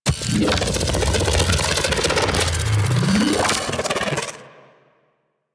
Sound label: Shotgun Alt Charge; Weapons; Revenant; Warframe; Tenno